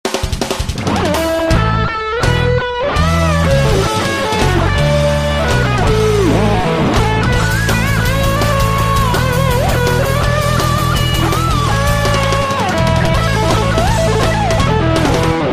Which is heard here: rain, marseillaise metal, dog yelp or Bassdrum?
marseillaise metal